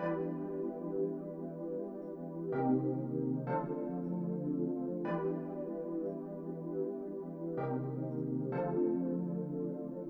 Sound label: Synth, 095 Emin, Hip Hop